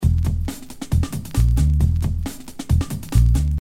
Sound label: beat